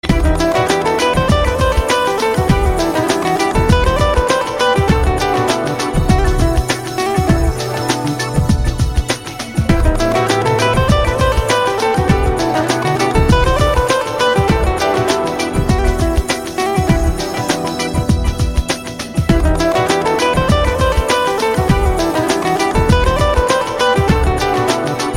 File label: guitar music